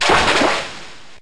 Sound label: Techno Blast Water; pokemon reborn e19 2022